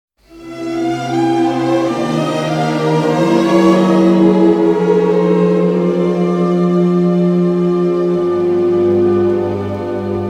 valse instrumental

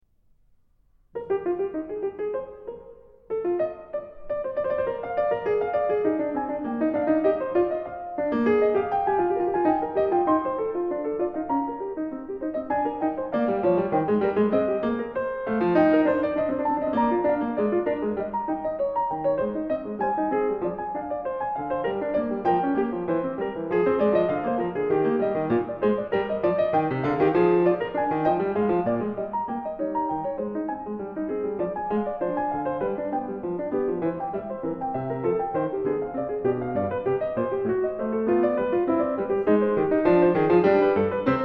fugue en mi bemol majeur; johann sebastian bach; bwv 852

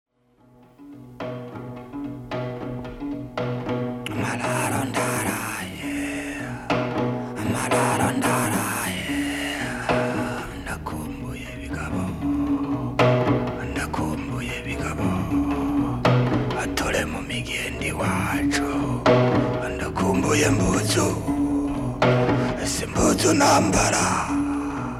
chant avec cithare; traditional music of burundi

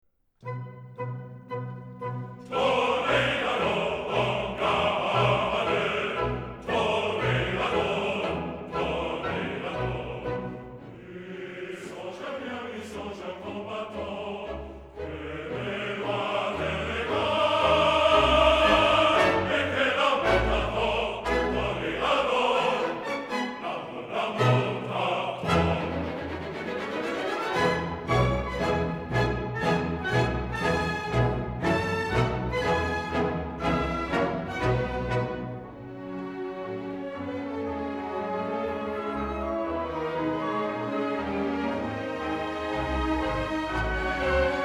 choeur; 2 acte; no 13bis; toreador en garde; dialogue

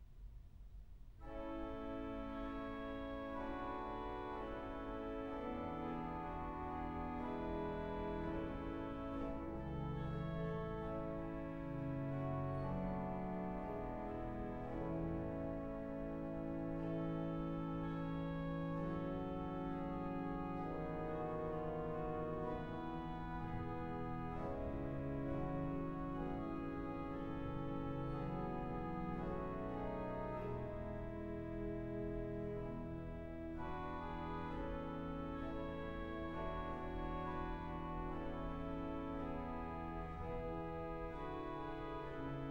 orgue ou harmonium; camille saint saens; neuf pieces pour orgue ou harmonium; ix elevation

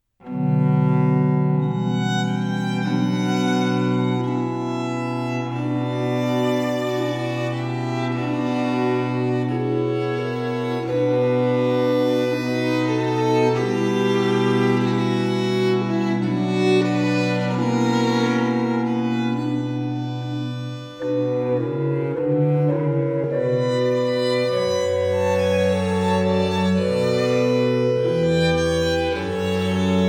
en ut c mineur sonata; johann pachelbel; partie ii

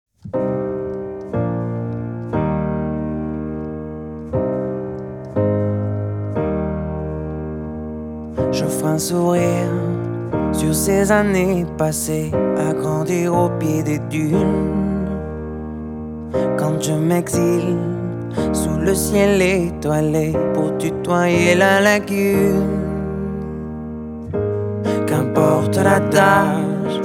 version acoustique

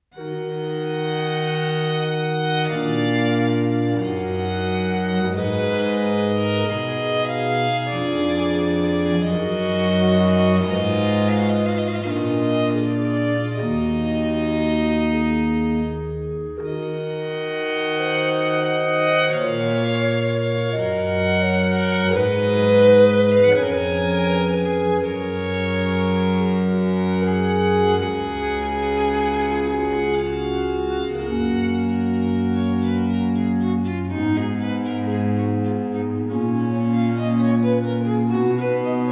en mi e mineur sonata adagio; johann pachelbel; partie iv

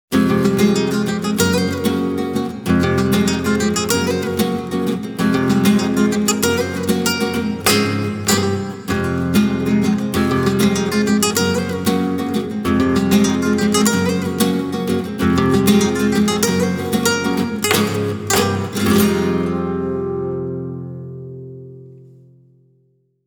guitare gitane

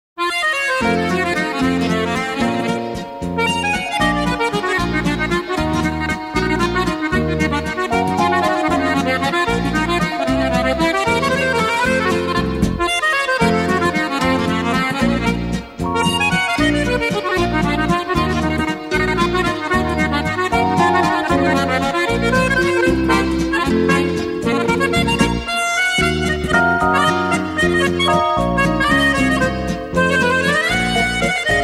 accordeon

